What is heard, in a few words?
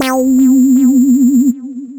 8-Bit Quack, Synth Lead